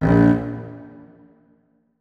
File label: notes, keyboard strings; Hideout Furniture